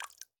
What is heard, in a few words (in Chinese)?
滴水石锥水滴落声